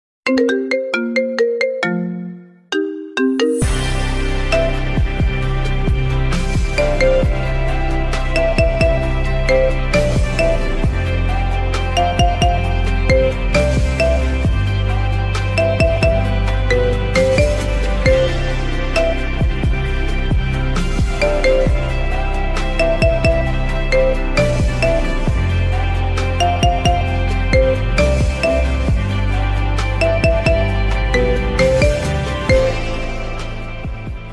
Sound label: Marimba